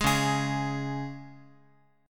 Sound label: Db 5th Db down Guitar; Db5 strummed; JGuitar